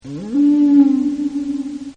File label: Whale Blow Hole; Whales; Animals